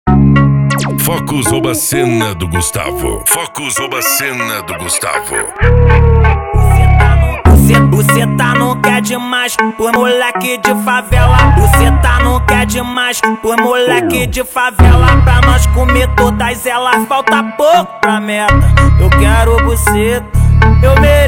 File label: trap e funk